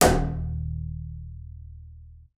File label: bass, D#1; Xsample VOL-5